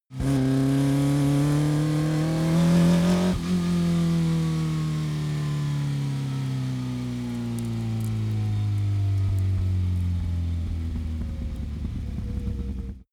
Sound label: car audi 50 gt short acceleration long deceleration